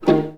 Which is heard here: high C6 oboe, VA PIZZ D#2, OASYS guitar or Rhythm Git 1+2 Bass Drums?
VA PIZZ D#2